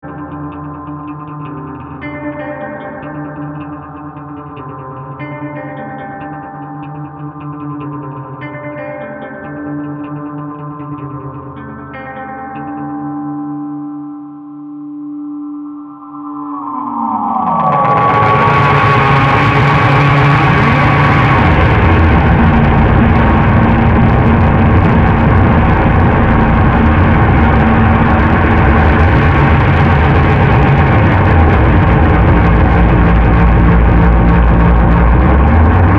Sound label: super buildup; ghostdelay